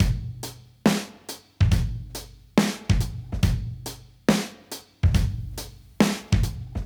Modern Breaks; Touch Loops; ClassicBreakSessions

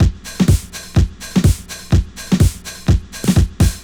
DEEPHOUSE BEATS 125

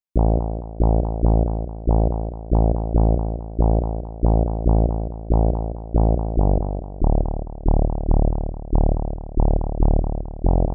HARD BASS 140 A, Hard Trance, Bass

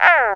ELEC FROG; ELECTRIC-SET

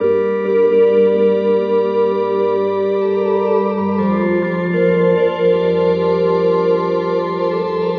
120 Cm far effects; Loops; Touch Loops; BlissedOutBeats

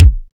BD BODY NEUT, bass drum; BASSDRUMS

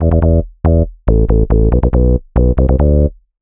ELECTRO BASS 140 A

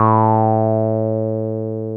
TB 303 BASS

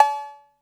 808 COWBELL; drum

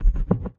Perc, drums; Touch Loops; BlissedOutBeats

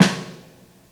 JAZZ SD, JAZZ-DRUMSET

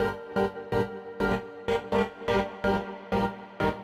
SYNTH INDST 125 A, Industrial Keys

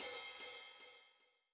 Hats, One Shots; Touch Loops; CosmicFunk

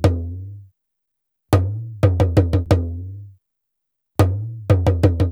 ETHNO EXTRA 90; ethno2

